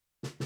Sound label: BD Out